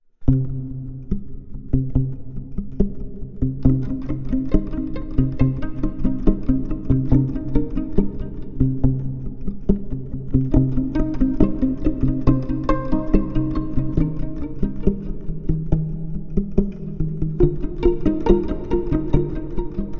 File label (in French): pizz qui passent